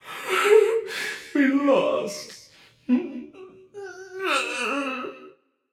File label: boohoo, voicepack; smite; jorm